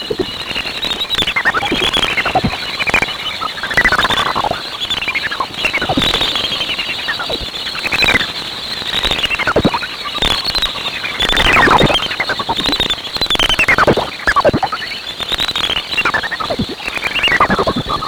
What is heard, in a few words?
Greater horseshoe bats emerging from roost